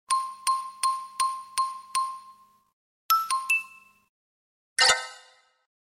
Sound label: tui diamond lake